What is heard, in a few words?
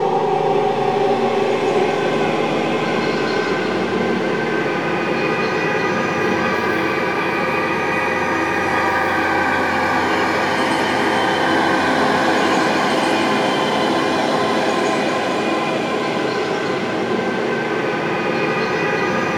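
ATM HORROR, Production Effects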